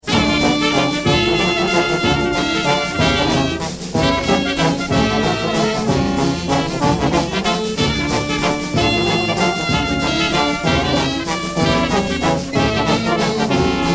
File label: fanfare